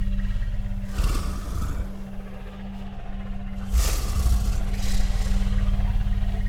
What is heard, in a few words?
Passive Breathing; Volibear